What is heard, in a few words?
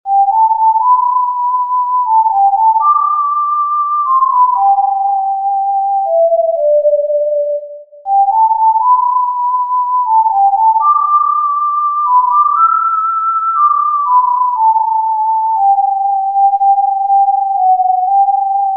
Tin Whistle